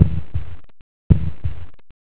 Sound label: bgmusic